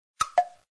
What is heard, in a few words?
correct